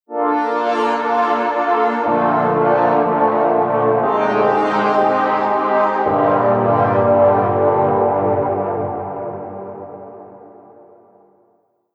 Growler; Preset: Count Audio Growler; Generate; Newfangled Audio

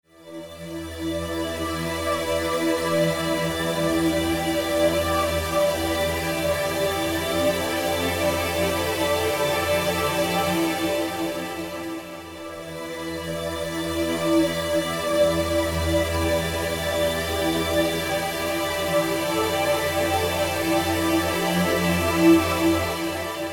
Spire Pad

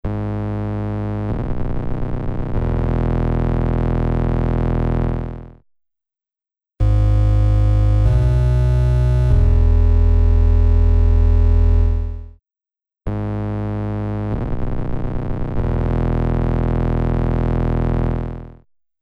Synth Bass Broken Fuzz; H3000 Factory Mk II; Preset: Broken Fuzz

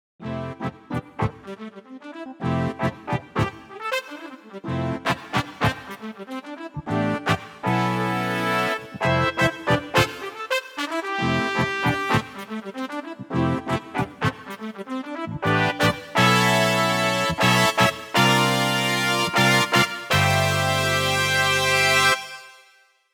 Full Brass; dexibell vivo s2

